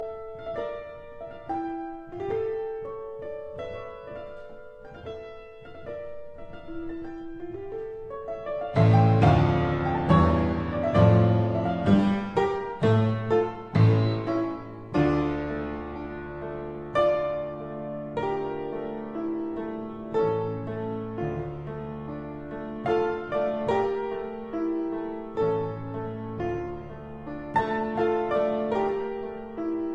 Piano Sonata In D major Adagio con molta espressione; Op 40 No 3